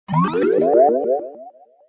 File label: Flying, notifications; Itel it1406